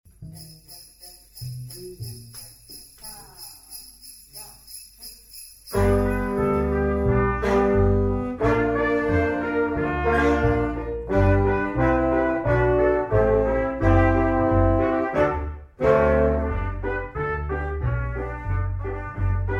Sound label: Jingle Bell